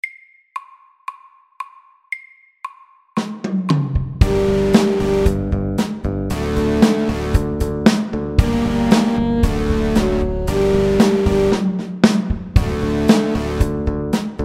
Elektrisk rock

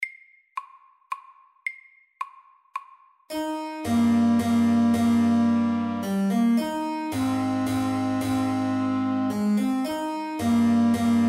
Menuett MEDIUM 110

